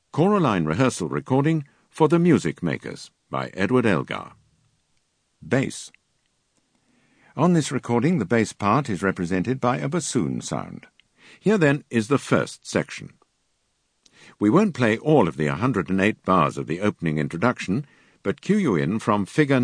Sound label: Bass; Elgar Music Makers